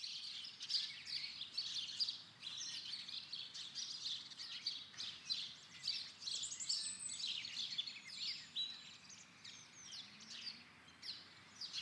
Birds; Rapid Gun; ipsc

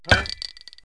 drop brk, cartoon

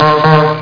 honk honk

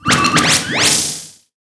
beam hit effect; Earth and Beyond; EA Games

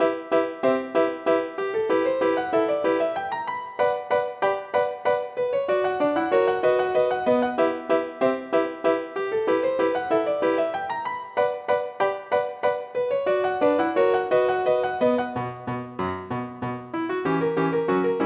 MELODY; Špidla: Hráčůj ráj 2; MIDI Music File

